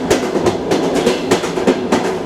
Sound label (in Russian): stuk, train rail; Factorio Paranoidal mod; newtrainsfx